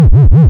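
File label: waveform FM triangle sine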